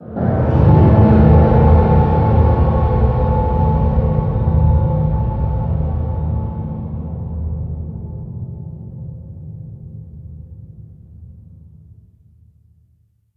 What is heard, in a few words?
Pylon idle; Barotraumatic